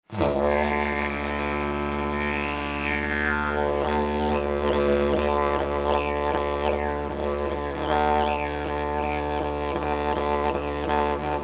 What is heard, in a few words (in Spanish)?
didjeridu